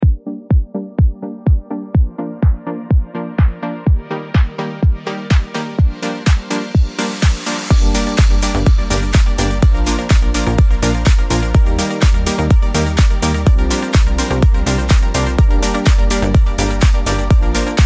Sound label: bright house beat